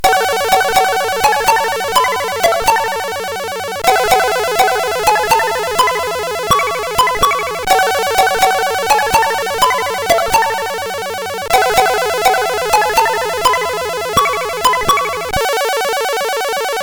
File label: CSG8580; Cane 02